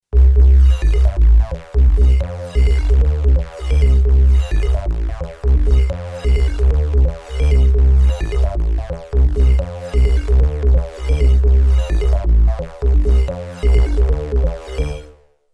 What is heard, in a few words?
bass